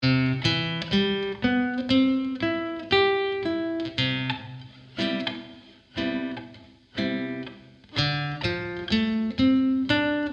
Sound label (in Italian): Esercizio in Do Maggiore